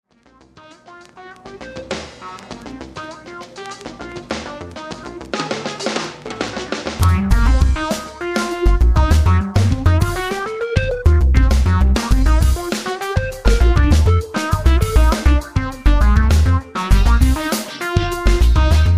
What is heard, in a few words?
Funkeys